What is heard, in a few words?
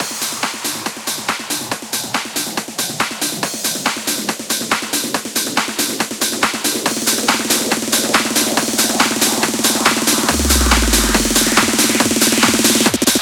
140BPM 8Bars, HandsUp & Trance Fills; Helpfull; Vengeance Samples